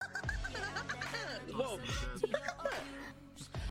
khmer giggles

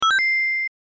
ring

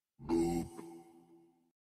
boop; aurelion sol

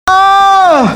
train

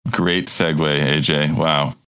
segue; great segue; nate sloan; switched on pop

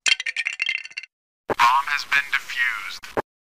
lego bomb defused

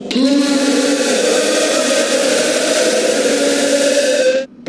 jumpscare; fnanormans 2

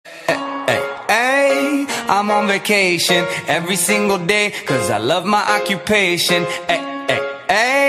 e e ei; im on vacation

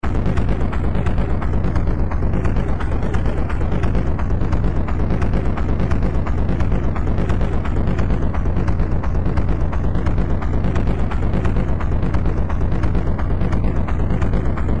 walking sound

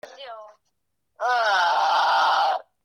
aaaaaaaaaa

